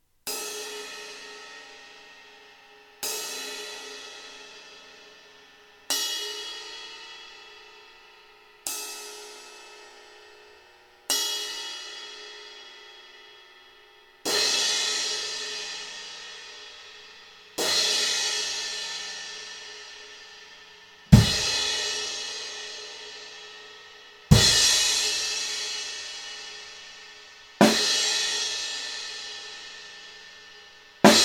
Crash 17; Ahmet Legend; Amedia

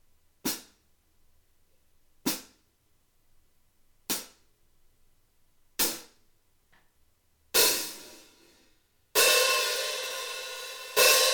Hi hat 13; Old School; Amedia

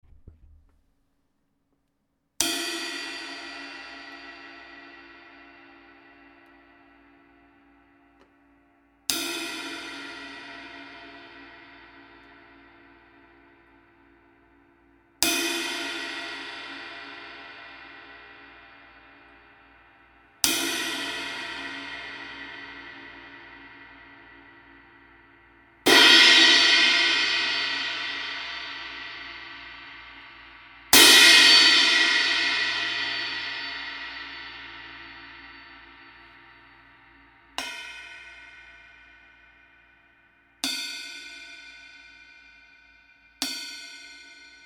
Crash Medium 20; Thrace; Amedia

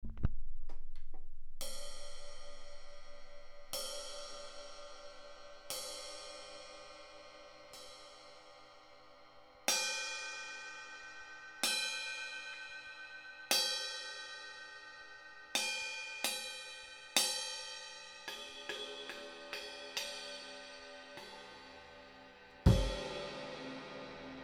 Ride 20; Amedia Vintage

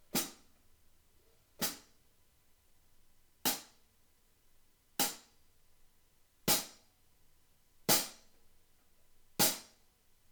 Hi hat Matched Medium 14; Amedia; Classic

